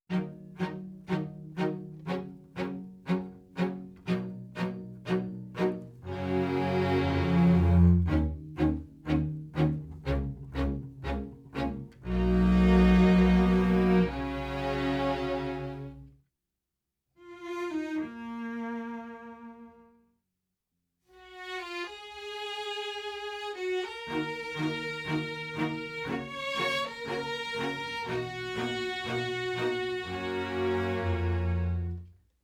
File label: Strings Flat; Daking; Fet III